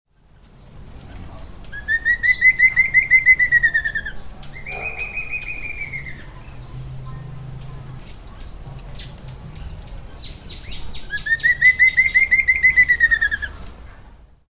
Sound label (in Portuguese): Dysithamnus stictothorax Choquinha de peito pintado, macho